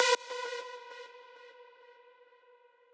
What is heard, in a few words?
lead